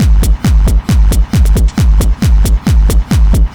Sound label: Drums Techno